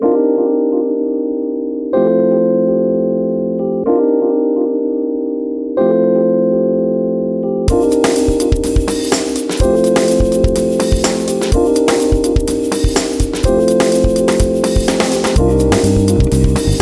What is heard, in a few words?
Modern Jazz; Dirty Productions